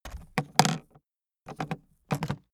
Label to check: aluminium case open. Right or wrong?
Right